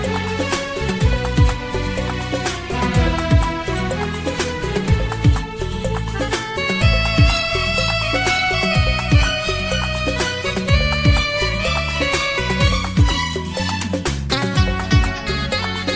hindi christian song ringtone, christian gospel

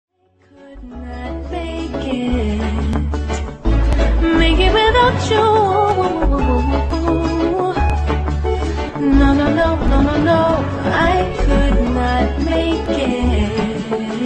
riddim

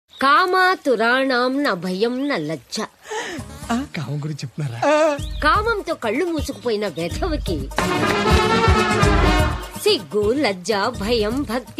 telugu funny ringtone

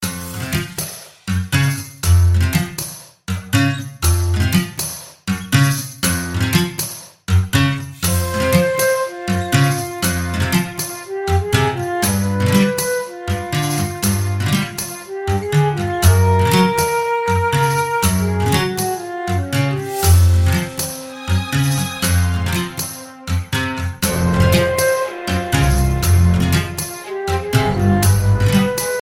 spanish beat ringtone

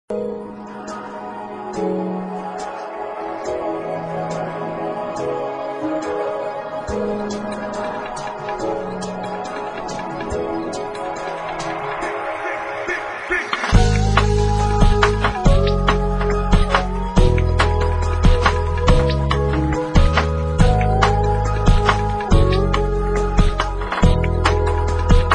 sweet spy music call notification tone; galaxy ringtones

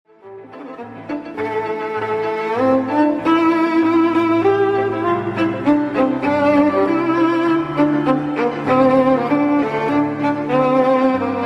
samsung core ringtone

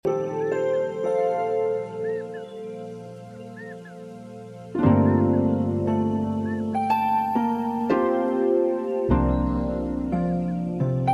lovely guitar music phone tone

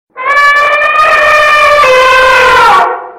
elephant sms funny ringtone

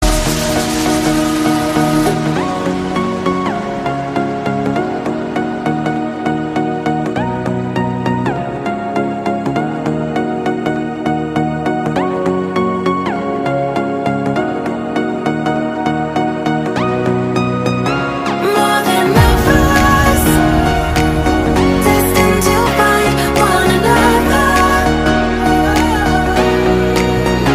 vivo y12 ringtone

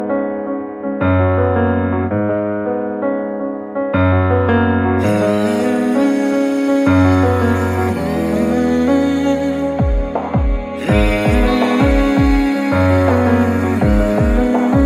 bollywood ringtone

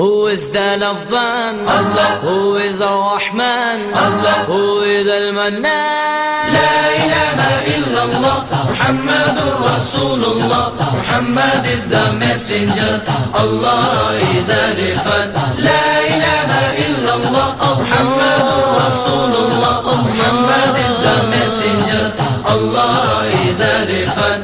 la ilaha illallah arabic

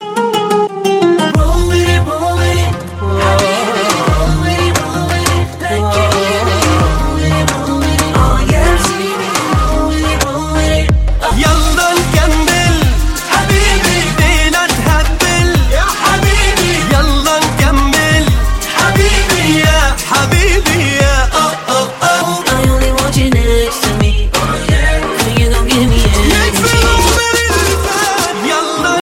lovely arabic background music tone

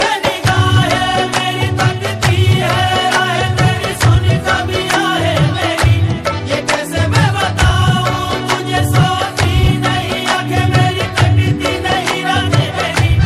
bollywood love romantic ringtone

